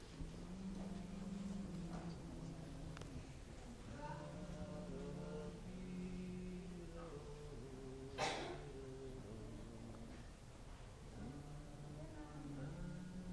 security guards in the Louvre singing Silent Night